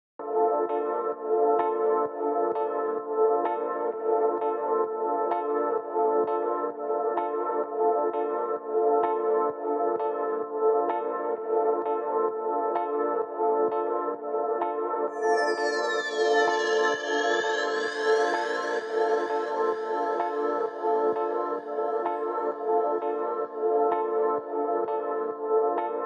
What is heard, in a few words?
Ambient; Shinkansen Bullet Train